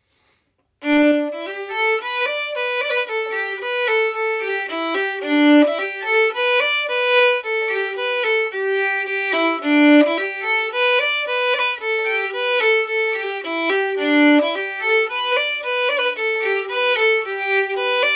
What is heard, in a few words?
blackwater polka, fiddle